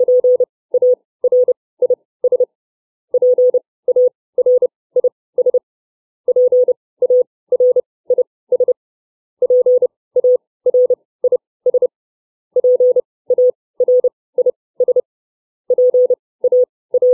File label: farnsworth timing, CW